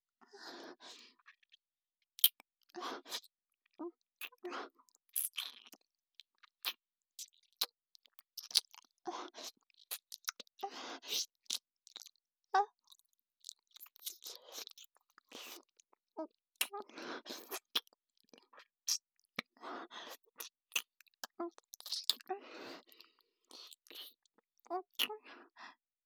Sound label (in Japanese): 少しずつディープに, キス